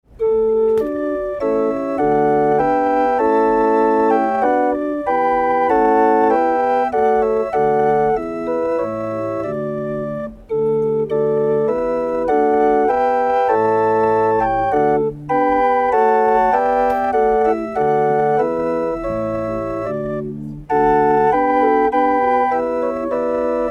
hurdy-gurdy

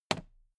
toolbox handle bounce heavy

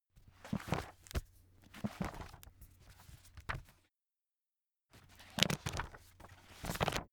book browse

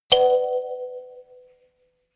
notification kalimba rattle triad minor high

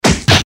eastern fist hit seq

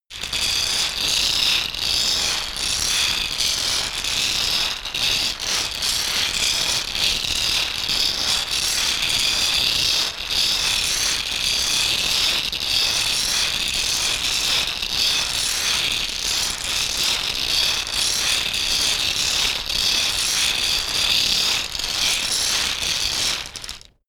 shaker wood rough constant rustle

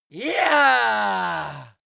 male victory evil

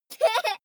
child laugh short

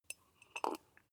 tea sip short

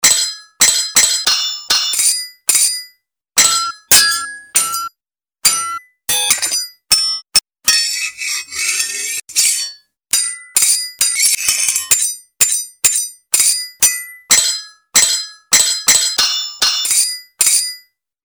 sword hit seq layer

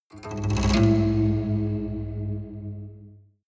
haunted strings roll low

